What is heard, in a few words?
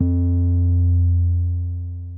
Drum BPM110; Martian Chess